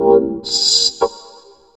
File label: vox, electro vox; Sound & Vision; Gigapack II CD 2 (Roland)